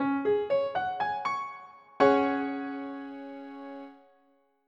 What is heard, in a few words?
C# SUS4